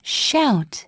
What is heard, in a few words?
Shout